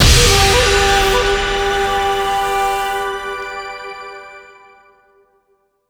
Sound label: scatter win; netent